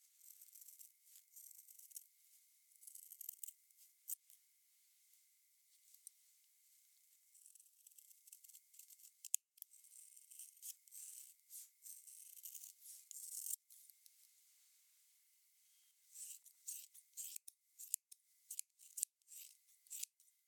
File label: Cutting Hair